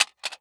Electronic Audio Tape Case Fall To Ground

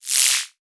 Multimedia Internet CD-Rom Flash Electronic

